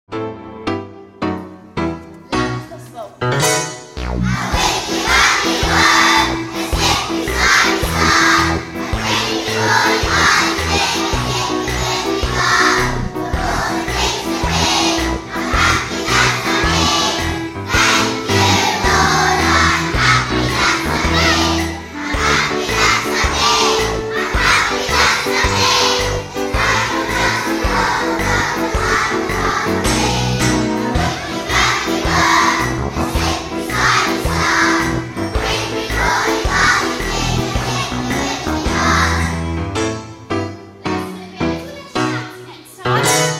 Key Stage 1 singing assembly, a wiggly waggly worm